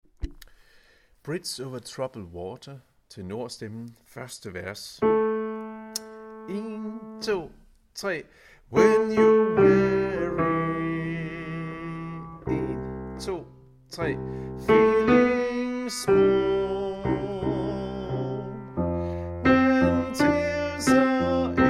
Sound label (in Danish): tenor; harlev koret